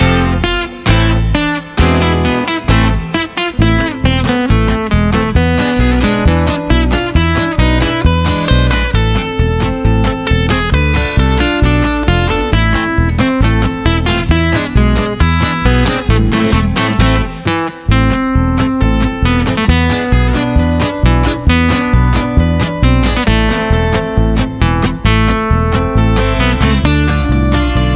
pod gitaru

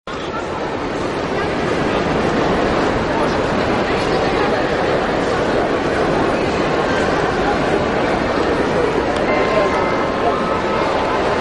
shum vokzala

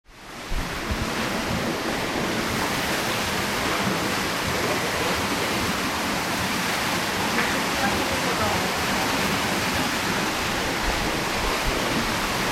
проливной дождь

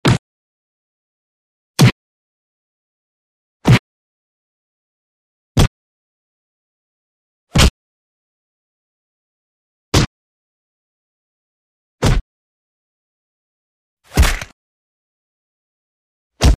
zvuki udara po telu

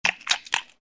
prikolnoe chavkane

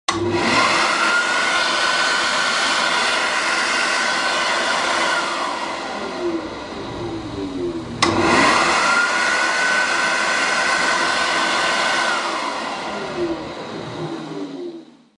zvuk sushilki dlja ruk

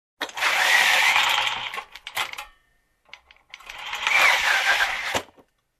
zvuk kinoekrana

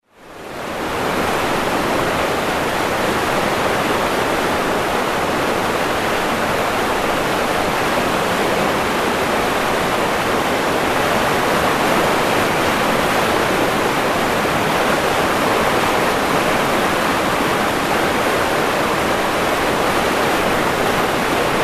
shum reki

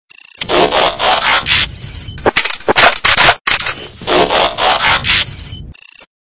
zvuk oruzhija transformerov

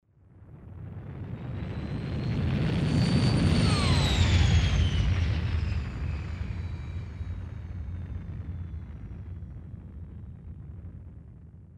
proletajuschij mimo kosmicheskij korabl

